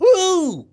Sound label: Clown Dodger, clown throw; Ringling Bros. and Barnum & Bailey